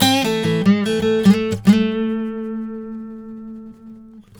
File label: BARI LICK, Blues Guitar; ILIO; Fretworks; 96 BARI LICK